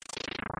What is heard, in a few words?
break block; smw